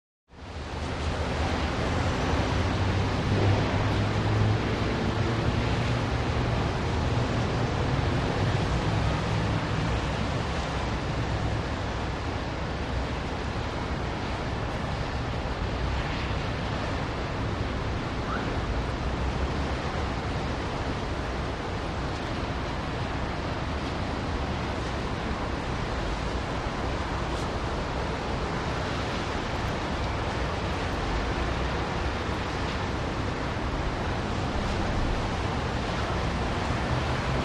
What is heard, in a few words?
City Traffic Rumble; big city